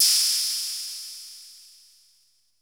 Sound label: CYMBAL, DRUMS; Music Store Sound Service; Einstein